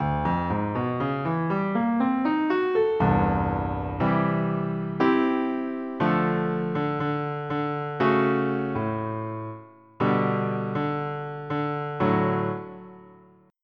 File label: Percussion; FF7